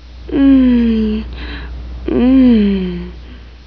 MOAN

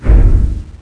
Shell Snd

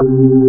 Low Fast F#4, B3; SoundFont - The Developer's Choice - Volume 1; b3fast

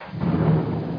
close

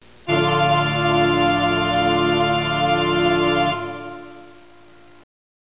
ORGAN; Broderbund Print Shop Multimedia Organizer Deluxe Edition

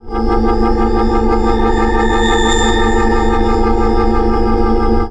UFO TRACT, disasters

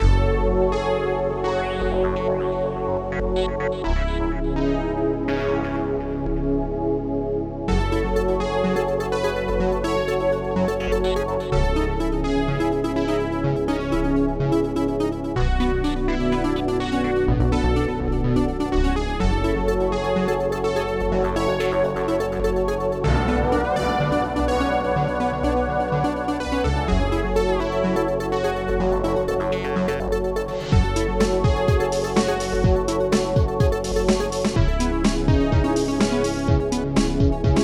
ambience; Loop Protracker Module; Amiga Format CD 2

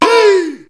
head conk; Learn to Program Basic Review Copy (Interplay)(June 23, 1998)

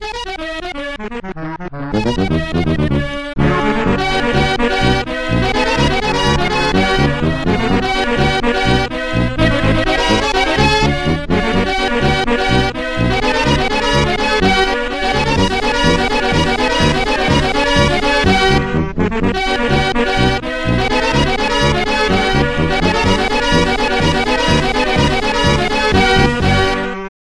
RAGTIME; SoundMOD Volume 2

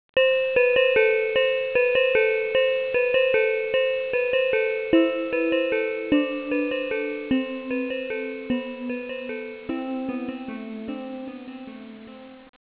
Christmas Gift; Start Windows; Christian Desktops for Win98

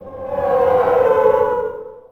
fiend; kult

